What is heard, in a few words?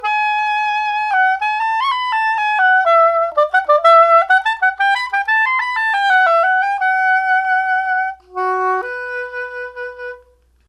soprano sax; INSTRUMENTOS MUSICAIS